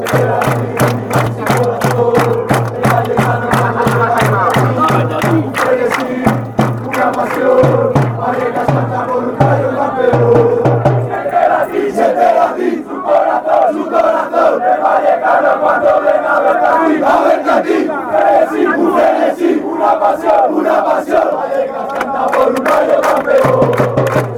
a passion, fan chants

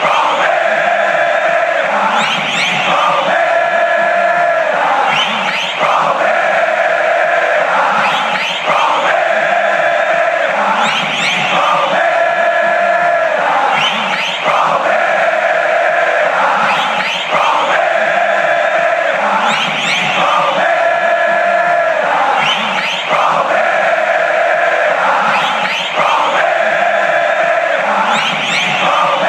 whistles, chants; palmeiras

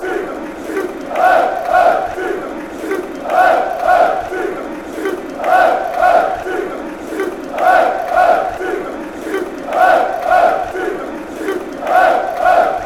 den haag chant

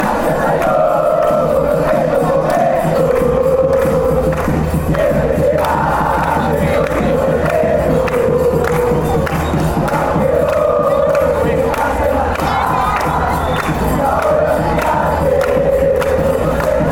chant, fanchants; once caldas

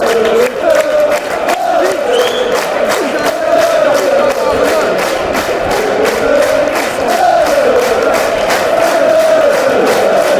great escape bournemouth fanchants

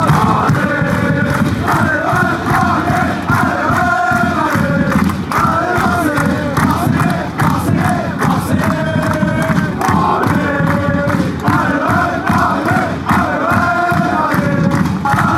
allez allez, fan chants; marseille

